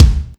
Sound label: kik; virtual drum sounds